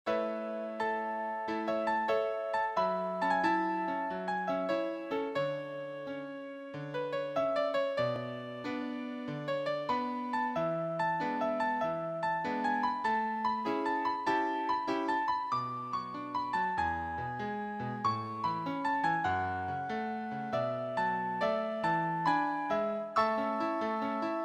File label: courante